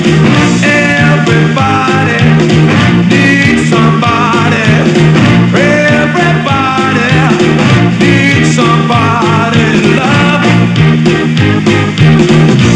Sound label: blues